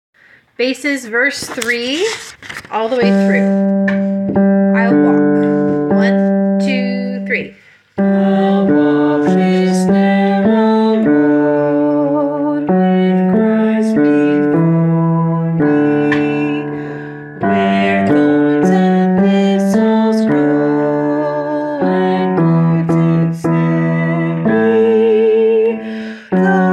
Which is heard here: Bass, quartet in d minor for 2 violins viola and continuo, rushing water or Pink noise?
Bass